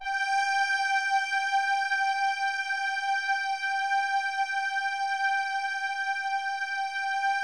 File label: G4, Keypad; Standard Instr; Keypad 3